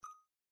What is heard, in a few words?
button small mouseover